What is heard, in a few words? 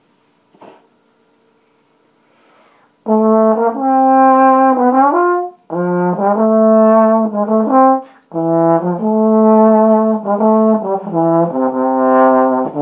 trombone; Memo